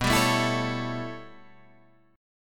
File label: A 7th B, chord; A7/B; strummed